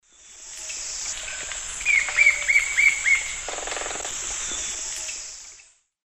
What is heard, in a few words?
Celeus flavus, call and drum; S. America; Eastern Lowlands